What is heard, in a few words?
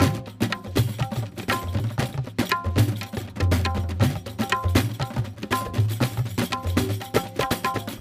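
music world music action part a percussion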